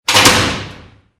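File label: clock; zvuk zasova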